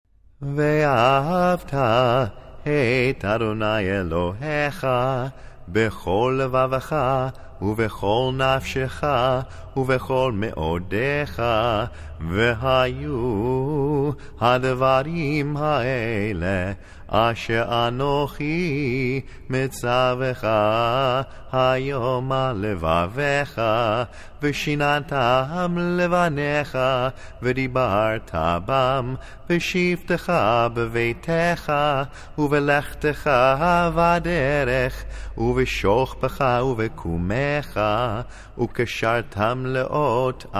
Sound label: Blessings Vahavta